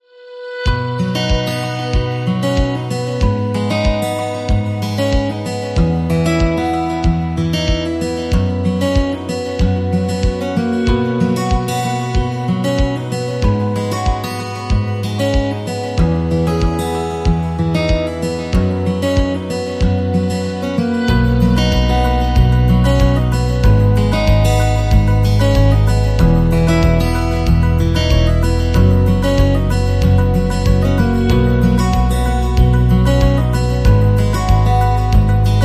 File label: Acoustic Guitar; Education; ForestMusic